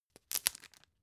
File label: tissue open